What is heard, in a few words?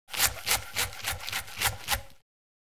Cutting lettuce vegetables crunch and squeaks